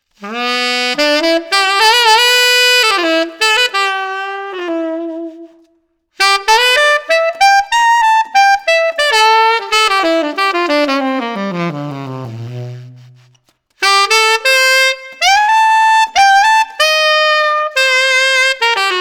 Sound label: Tenor Altissimo Reverb, tenor saxophone mouthpiece; Kessler C Tenor 7 Star